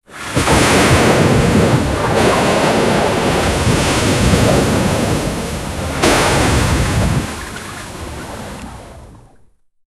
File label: kraken sinking a large sh, ship